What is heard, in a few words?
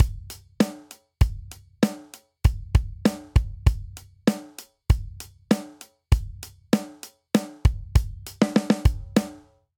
Straight Hip Hop Outro 98 bpm; 4 4; Ableton; Factory Packs; Drum Booth